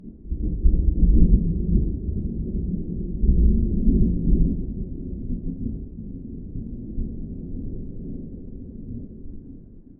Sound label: Weather, Thunder, Tropical, Distant Thunder, Booming Thunder; viewfinder